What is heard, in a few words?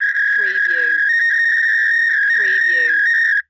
Fax Machine Connect